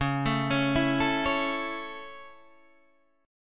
C sharp Minor Sharp 5th C sharp Guitar Standard, chord; arpeggiated; 9,12,11,9,10,9; JGuitar